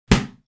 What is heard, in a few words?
Boxing blocking a punch